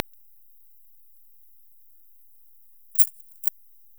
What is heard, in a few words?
Kerivoula papuensis